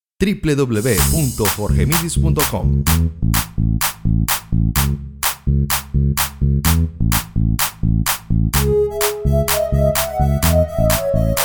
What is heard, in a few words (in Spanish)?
merengue